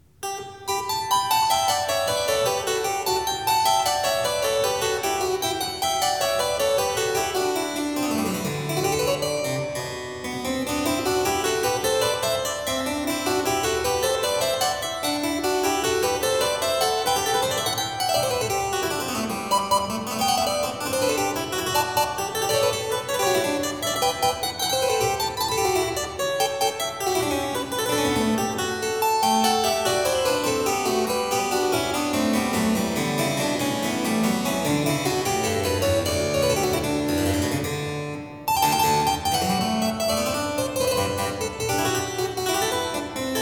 Variatio 23 a 2 Clav.; BACH; GOLDBERG VARIATIONS

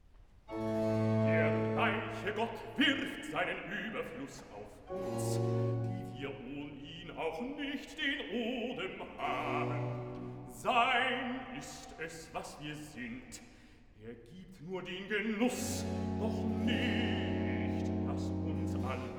Recitativo (Bass); Bach; Cantatas; BWV039; Brich dem Hungrigen dein Brot; Der reiche Gott wirft seinen Überfluss

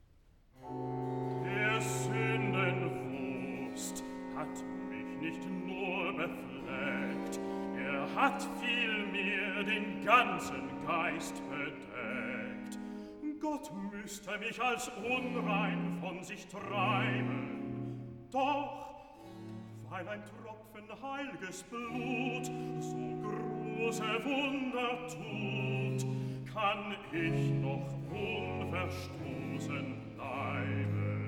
Recitativo (Bass); BACH VOCAL; CANTATAS; BWV005; Wo soll ich fliehen hin; Der Sьnden Wust hat mich nicht nur befleckt

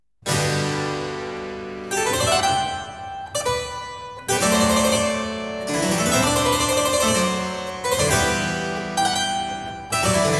Variatio 16 a 1 Clav. Ouverture; BACH; GOLDBERG VARIATIONS

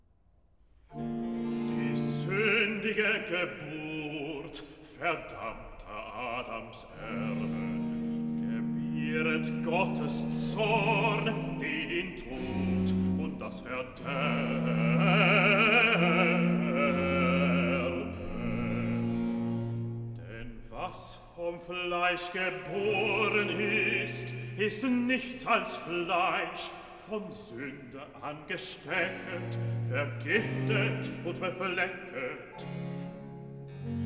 Recitativo Bass; O heil'ges Geist- und Wasserbad; Die sundige Geburt verdammter Adamserben

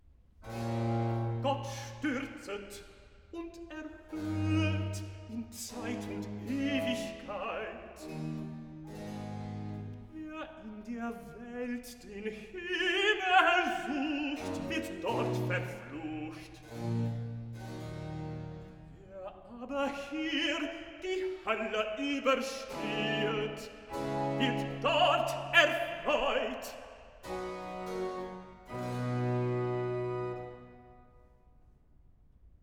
Recitativo (Tenor); Bach; Cantatas; BWV075; Die Elenden sollen essen; Gott stürzet und erhöhet

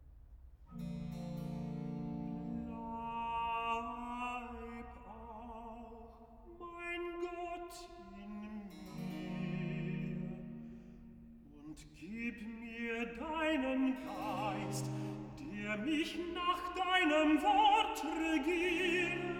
Recitativo (Tenor); Bach; Cantatas; BWV 148; Bringet dem Herrn; Bleib auch mein Gott in mir

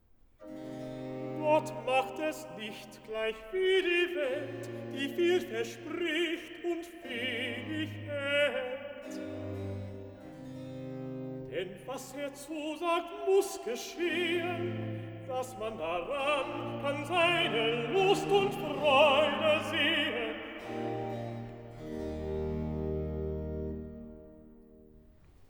Recitativo Tenor; Gott macht es nicht; BACH VOCAL; CANTATAS; BWV 86; Wahrlich, wahrlich, ich sage euch

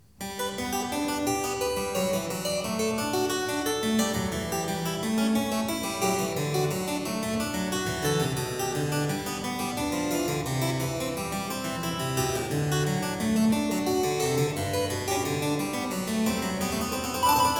Variatio 20 a 2 Clav.; BACH; GOLDBERG VARIATIONS

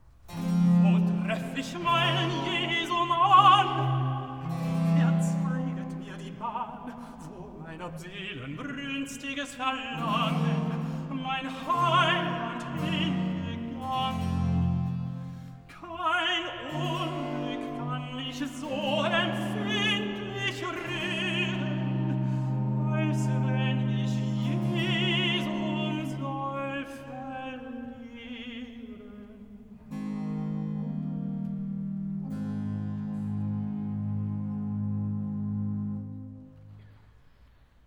Recitativo (Tenor); Wo treff ich meinen Jesum an; Bach; Cantatas; Mein Liebster Jesus ist verloren

